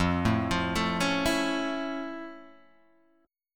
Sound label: F Augmented Major 7th F Guitar Open G